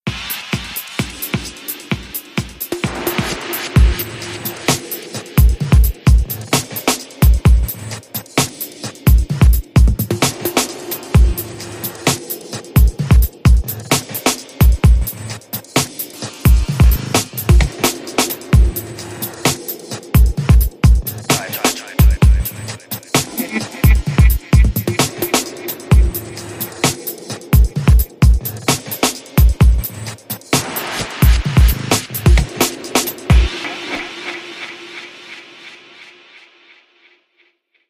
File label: Marker Beat